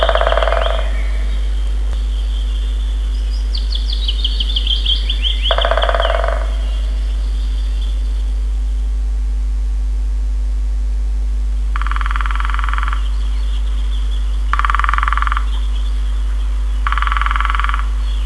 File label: woodpeckers